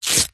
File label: surfboard leg rope leash velcro rip